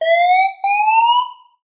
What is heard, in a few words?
police siren isolated clean short warning burst attention; zapsplat